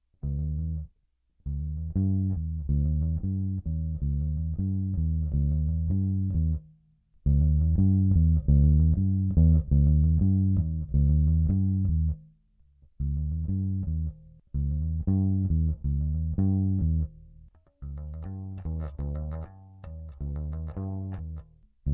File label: bass Buff